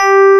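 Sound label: clang electric piano; NEW AGE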